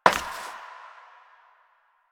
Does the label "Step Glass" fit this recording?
Yes